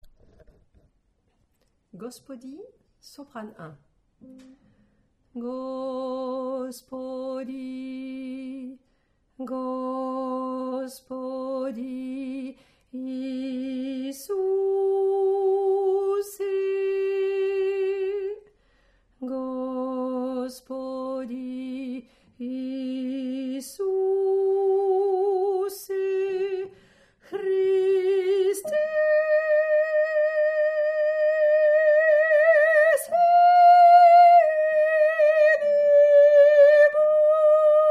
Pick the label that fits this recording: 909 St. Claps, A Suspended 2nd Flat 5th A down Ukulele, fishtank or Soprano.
Soprano